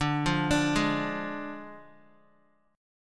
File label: D Diminished, Guitar; x,5,5,7,8,x; 4F,4A,5C,5G,5C,6E; arpeggiated